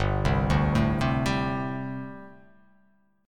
G Suspended 2nd Suspended 4th down; Gsus2sus4 arpeggiated; JGuitar

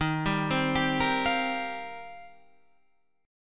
D sharp 6th Add 9th D sharp Guitar Standard; arpeggiated; JGuitar

